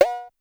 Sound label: Cowbell 808 Up Fast, Pitch Env; Clavia DDrum-AT; Pitch Env Various